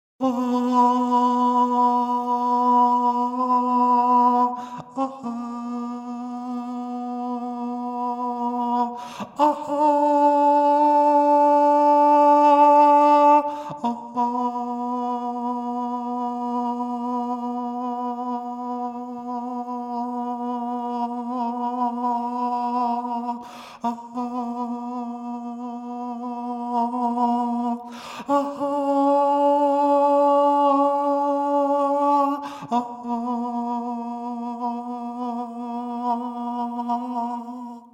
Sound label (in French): noel tenor